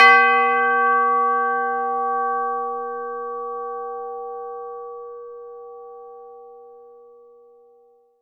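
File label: CHIME A3, Synclavier Percussion; ILIO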